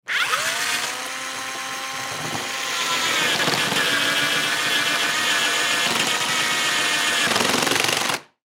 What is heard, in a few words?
Saw Electric, Saw Electric Turn On Run Blade Loosen From Chuck Turn Of; Industry; Saws; Blastwave